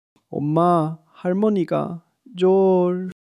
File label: crying